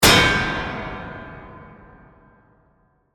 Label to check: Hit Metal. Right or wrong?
Right